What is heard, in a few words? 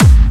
Bassdrums Clubby Kicks; Vengeance Samples